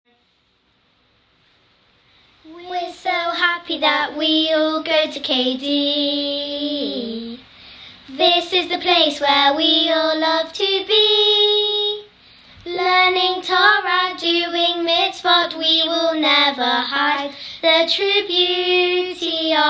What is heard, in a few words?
School Song